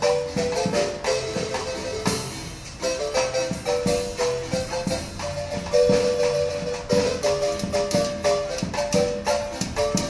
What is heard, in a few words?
street sounds marimba